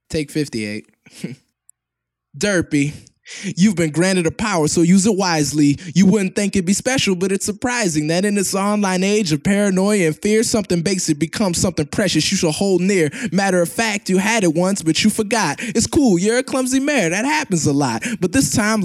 vocals only 97.5 BPM; Acapellas

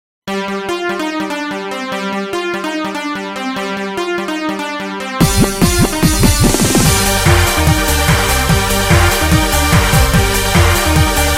Royalty FREE Anime Music horror, escape

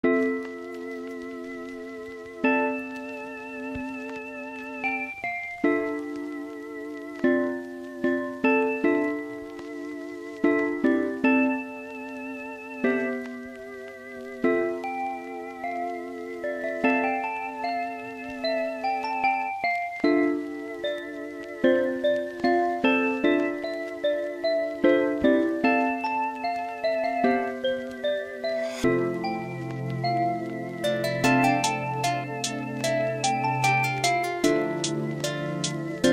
Chilling with Tavi [Trap Hip Hop]

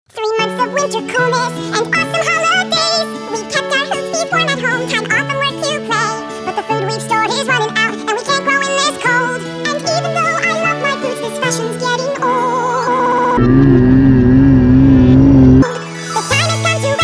Vocals

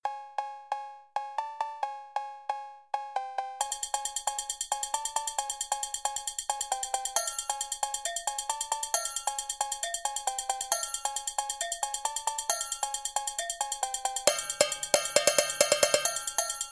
120% Percent Cowbell

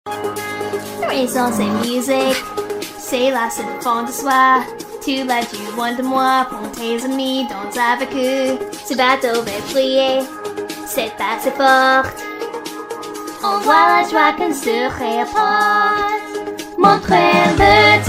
All Good - Tout Bien [French FanDub Song]

